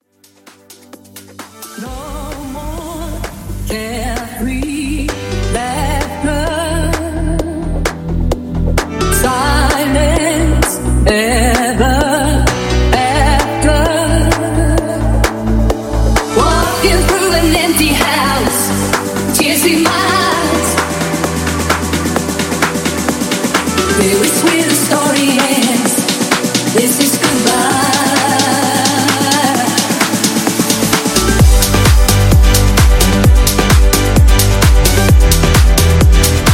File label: Funky House Mix; Remixfreakz